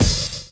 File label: DRUM CHOP; AMG Gota Yashiki Groove Activator